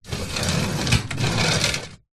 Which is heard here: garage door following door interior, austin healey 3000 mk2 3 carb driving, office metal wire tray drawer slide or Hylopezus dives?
office metal wire tray drawer slide